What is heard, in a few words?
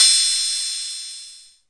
Korg KPR-77, drum machine